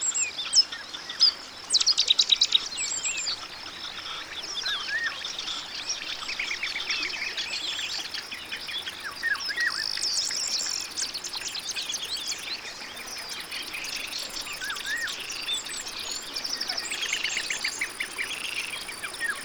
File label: Nature amient loop; kinderlieder